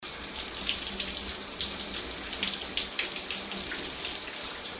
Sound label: shower loop